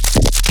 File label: weird bass